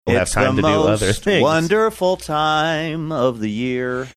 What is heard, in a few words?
singing